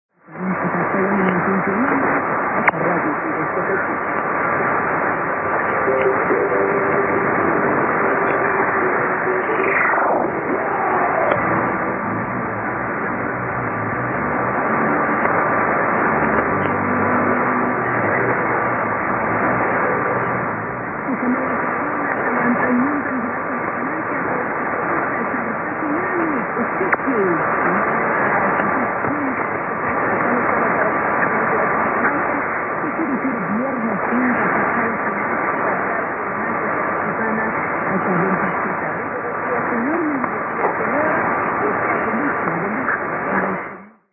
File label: 6155.3kHz Radio; ボリビア; Radio Fides